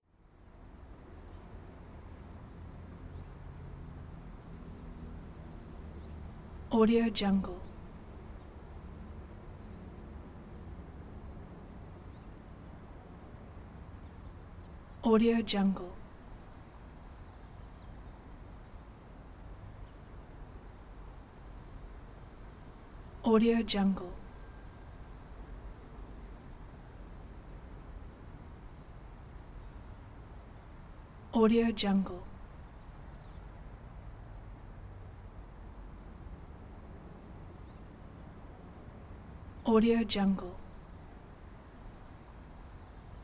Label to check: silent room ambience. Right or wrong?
Right